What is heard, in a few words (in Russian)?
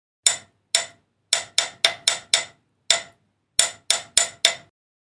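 стук по батарее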